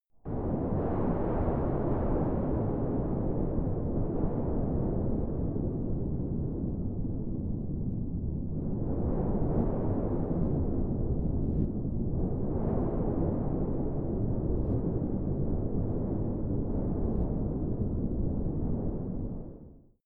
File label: wind soft interior